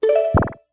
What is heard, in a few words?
Options Open; Open and close the Options menu (Recorded)